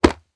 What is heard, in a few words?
Impact Human Bump Shove; Impacts